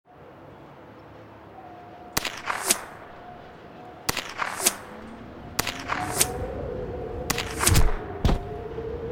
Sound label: Rocket top